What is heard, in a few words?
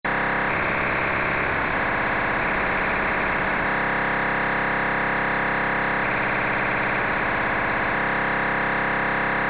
DMR idle